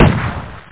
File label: tank gun